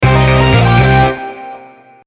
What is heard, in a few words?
hype up